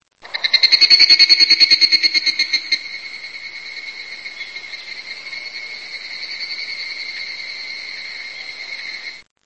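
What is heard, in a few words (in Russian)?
цикада звонкий близкий в пространстве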